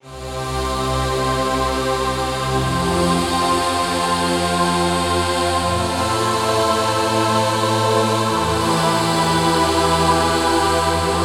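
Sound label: Chorus, synth; KORG OASYS; oasys assault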